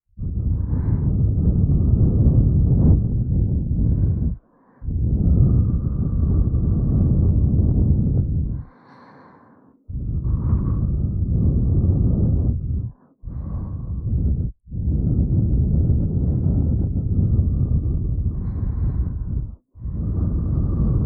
両耳ふー3 分, ASMR

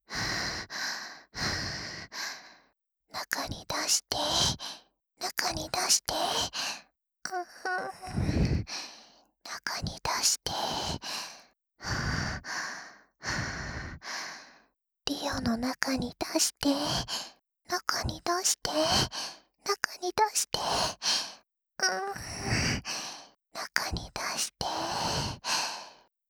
中に出して 喘ぎ; ハーレムオナサポ 4人のメイドがご主人様のオナニーをお手伝いするバイノーラル～文、千曜、名月、莉桜～

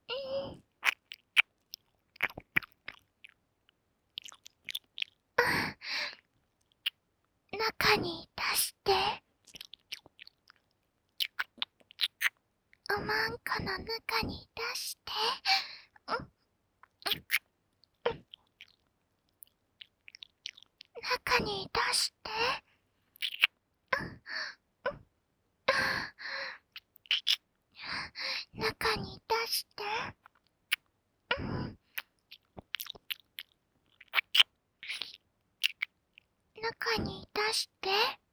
中に出して 耳舐め喘ぎ; ハーレムオナサポ 4人のメイドがご主人様のオナニーをお手伝いするバイノーラル～文、千曜、名月、莉桜～

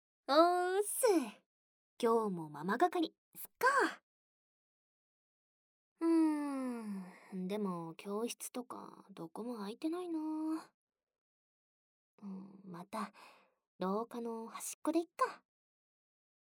ベロチュー; ママとたくさんベロチューしような

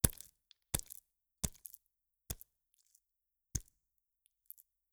ぱんぱん; 効果音

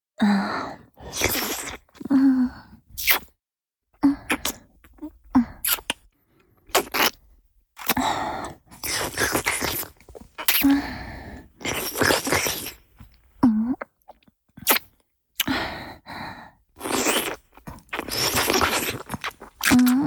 乳首舐め(弱):片方を指でいじりながら、もう片方の乳首を舐める (つんつん無し), チュパ音; チュパ音抜粋集

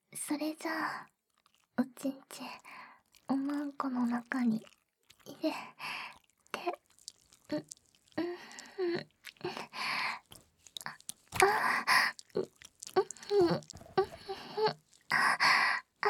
妊娠耳舐め生セックス, ASMR; ルリちゃんの妊娠耳舐め生セックス

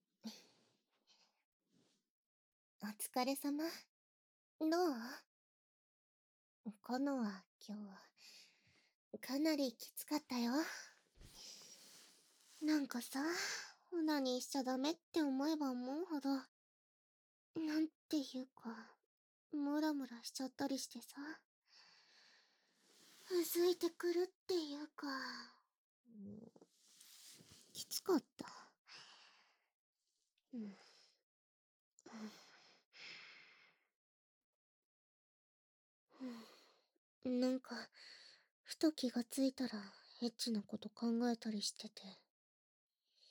お耳はむはむ; オナ禁3日目の夜

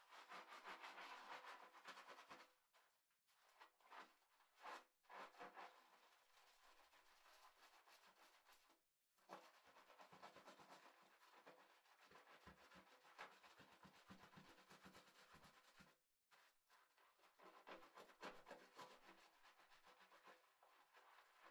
相手 シャンプー を する, お風呂に関する音; KU100

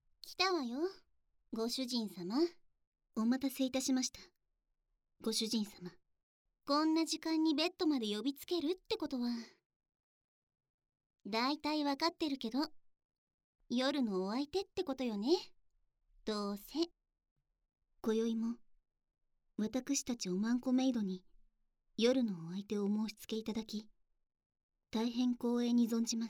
耳舐め奉仕, ASMR; SE無し; おまんこえっち

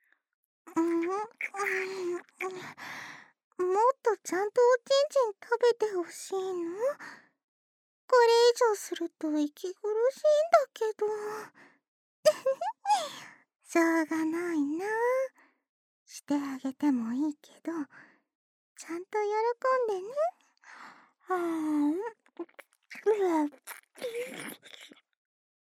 フェラ

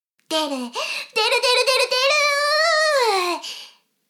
しこしこ音声; 少佐殿; 牧場戦腺

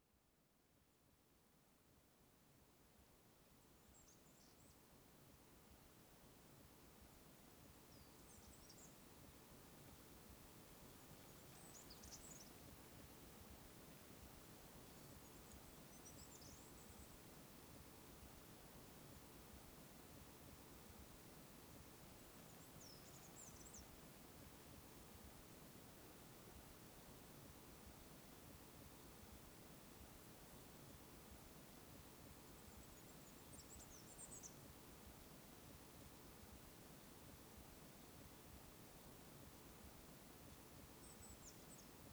野鳥の森（野鳥たちの鳴き声。森の音。森林浴ASMR）; 尾根山（おねやま）; ラン

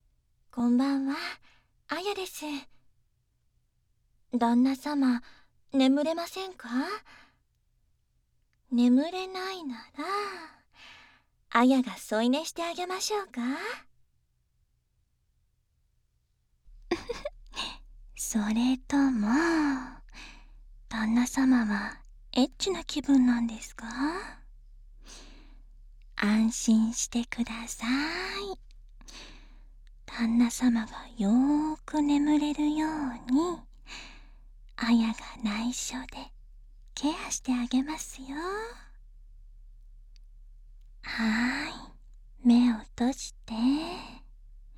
耳舐め手コキ; 特典Rナースあやエロエロ耳舐め手コキ; 右耳

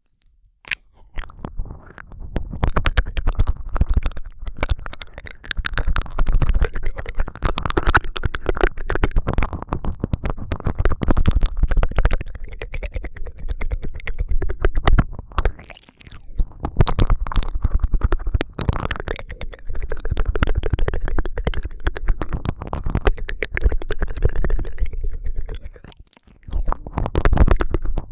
舌を素早く動かすちょっと激しい耳舐め, ASMR

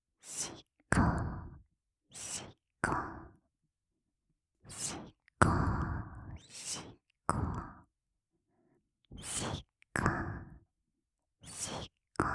しこしこ ゆっくり

